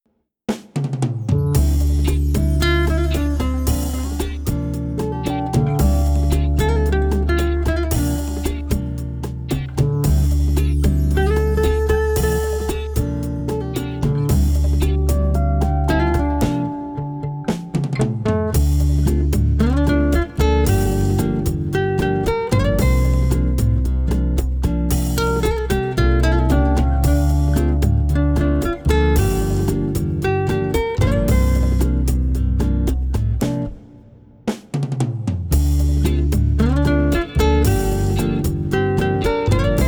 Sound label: flamenco vibes 432 hz